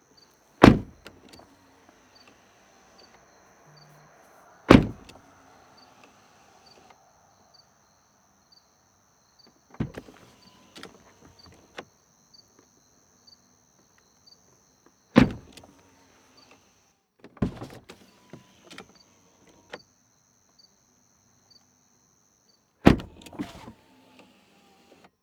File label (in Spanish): BMW puertas abren y cierran INT